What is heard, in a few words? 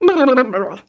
voice female critical